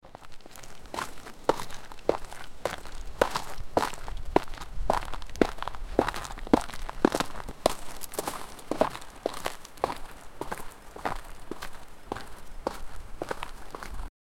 running down a hill

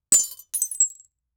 the character jumped onto, glass

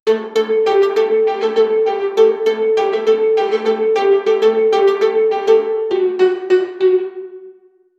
light suspenseful melody, melody

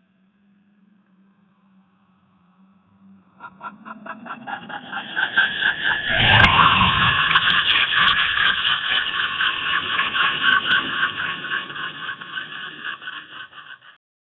Renault Megane RS exhaust; car

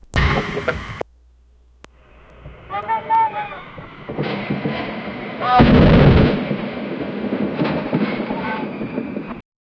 helicopter rotors poundin; strikes

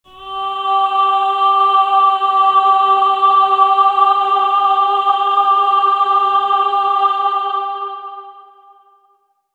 a choir of angels singing; angelic

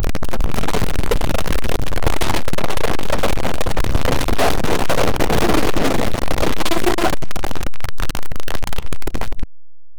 tone apocalyptic horror

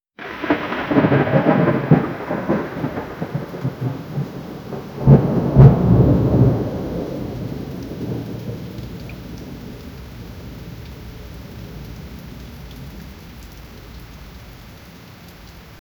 rain sounds or thunder

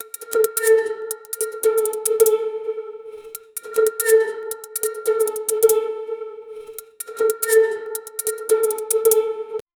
music percussive beat, flute

